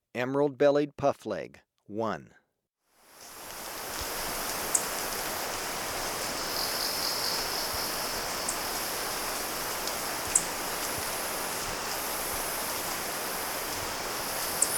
Emerald-Bellied Puffleg; S. America

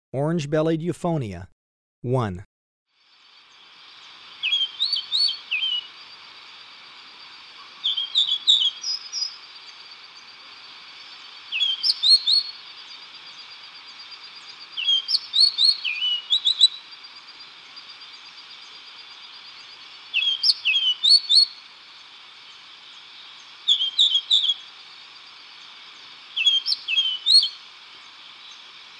Orange-bellied Euphonia, Birds of Ecuador; S. America

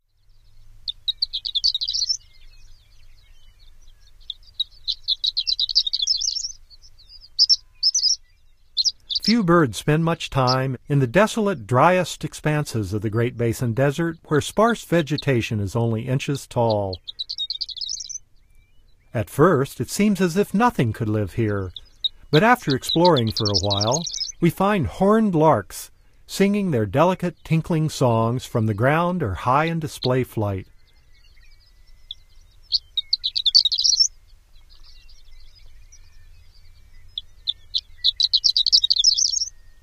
Horned Lark, Bird Sounds; North America; Common Western Species; Know Your Bird Sounds

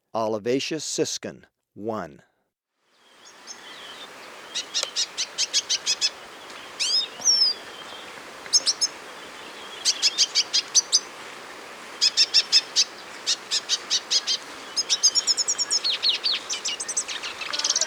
Olivaceous Siskin, Birds of Ecuador; S. America

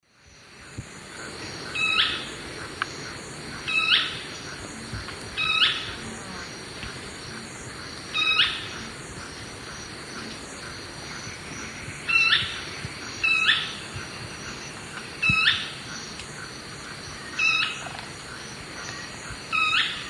Cnipodectes subbrunneus, song; S. America; Eastern Lowlands

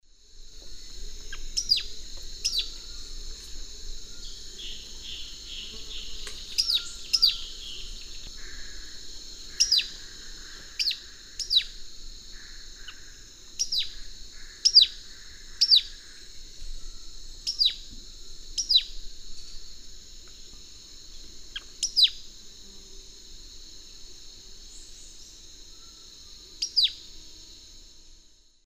Microrhopias quixensis, calls male; S. America; Eastern Lowlands

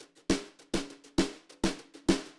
Drums, Jazz

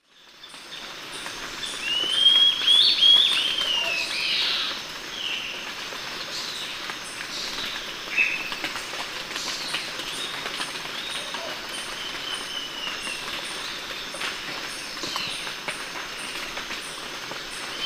Rhegmatorhina melanosticta, song; S. America; Eastern Lowlands

